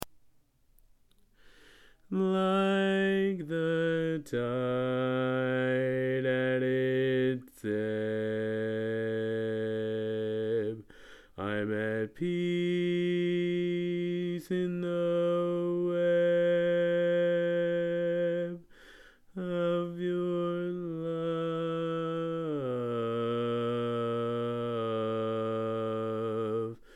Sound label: Bass